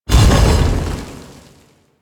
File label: Roll; Warframe; Warframes; Qorvex